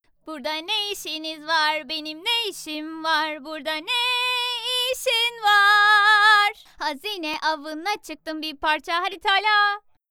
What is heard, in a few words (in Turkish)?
Şarkı